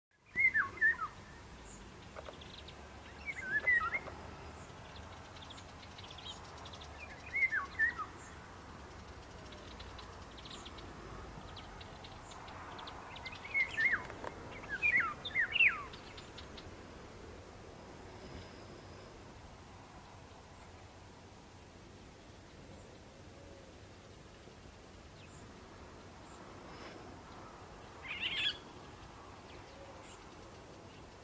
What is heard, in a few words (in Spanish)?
Oropendola macho cantando